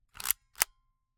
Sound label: Camera, Kodak Extramax, pocket, 110 film, plastic, metal, advance film, slide lever; Eiravaein Works; Vaeyan IV